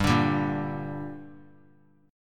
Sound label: G Minor G down, chord; strummed; JGuitar